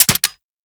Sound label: Lever Action Rifle Mech A; Warframe; Tenno